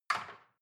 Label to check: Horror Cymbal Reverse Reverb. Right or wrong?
Wrong